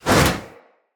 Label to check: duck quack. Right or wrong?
Wrong